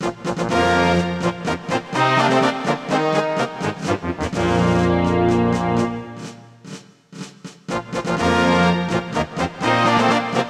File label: Win Fanfare; Motor Toon Grand Prix